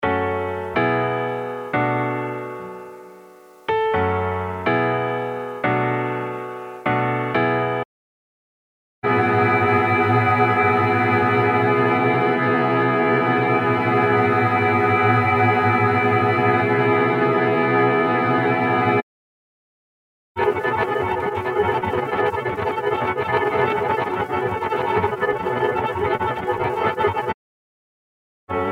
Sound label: Piano